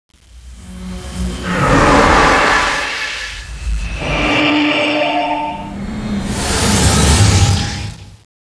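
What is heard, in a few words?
hvoice wavetable; monsters infect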